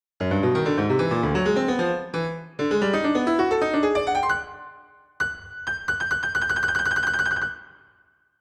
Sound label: etude, piano; pieces pour piano; etude 04 a